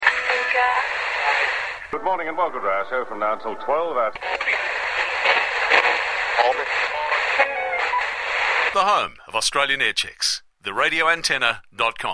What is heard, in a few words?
Aircheck Skywatch Jingles